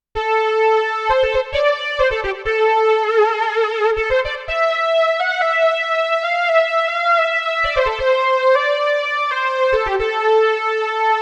modal electronics cobalt5s

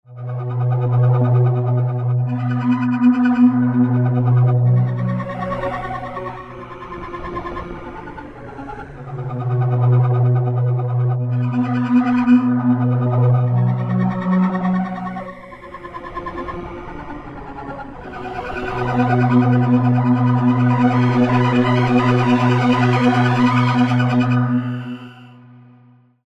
asm hydrasynth explorer test sound

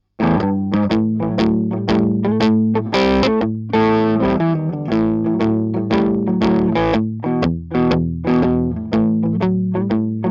germanium drive smooth highs full boost; Chandler Limited

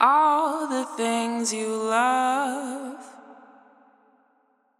vocal samples fx; ghosthack free vocal samples

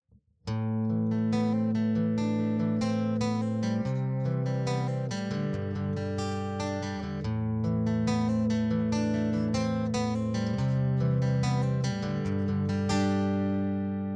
di acoustic gibson j 200; Line 6 Variax JTV-69; 95er

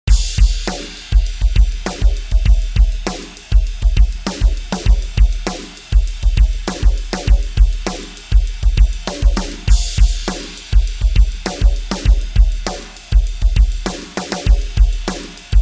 drums low pass parallel plus envelope; universal audio moog multimode filter xl; notch

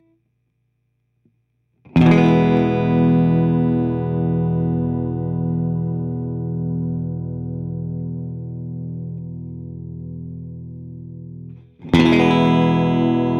clean guitar; kma fuzzly bear

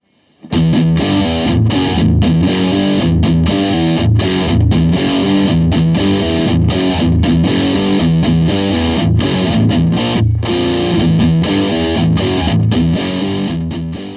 high gain mid scoop; Gitarre

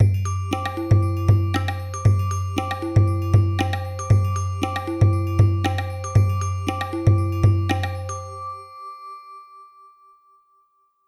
Alesis SamplePad Pro

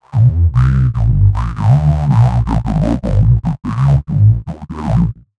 roland vt-3 bass

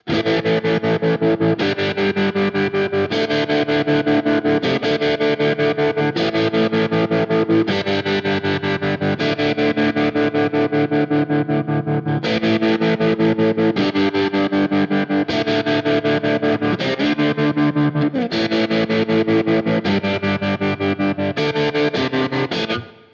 carl martin surf trem

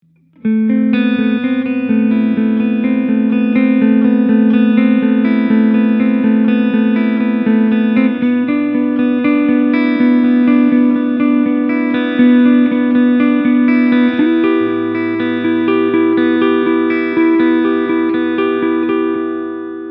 ESP LTD Vulture clean picking neck; james hetfield signature

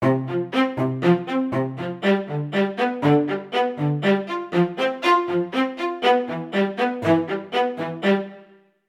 strings RS127 rack 3.5kHz 4dB; softube abbey road studios brilliance pack

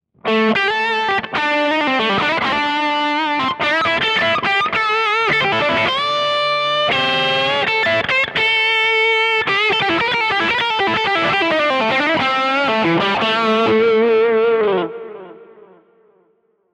harley benton st 62 mn vw

